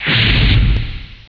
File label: rolling